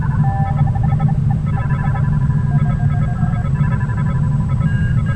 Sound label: ambience; Galor